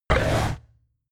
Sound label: lioness roar short